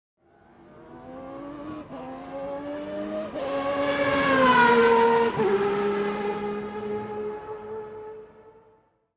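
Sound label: Sports Car Close Up By Fast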